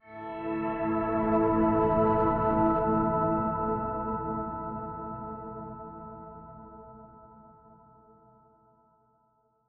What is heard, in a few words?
Organs; Archaica: The Path of Light